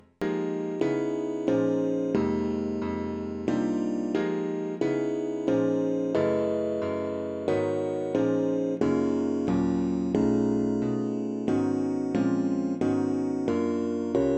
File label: piano, solo piano